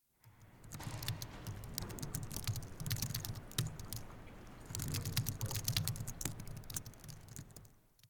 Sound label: Mac Typing